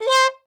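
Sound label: trumpet C hi mute plunger wop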